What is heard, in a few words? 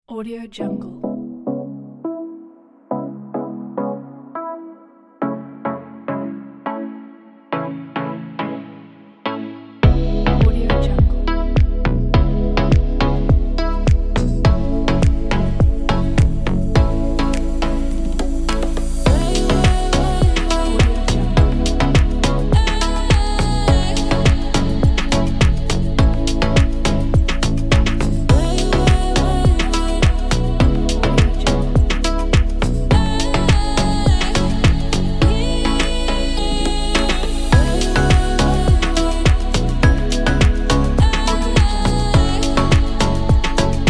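lounge amapiano fashion house music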